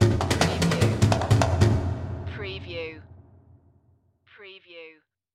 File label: Musical